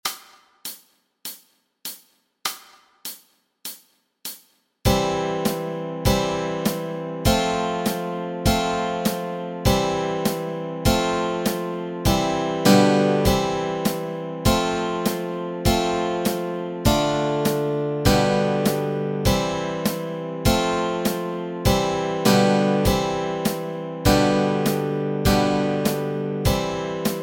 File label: chords